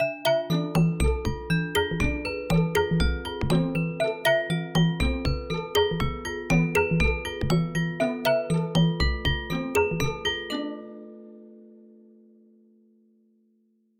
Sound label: jingle; betises